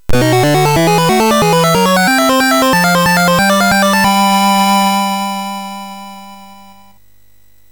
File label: Slap Fight, MOS6581R3; hvsc